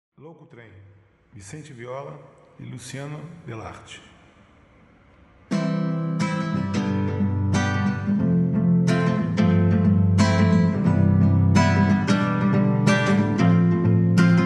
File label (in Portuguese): viola